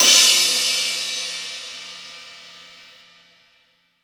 crash cymbal single shot B key; cymbal drum samples

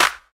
studio clap sound f sharp

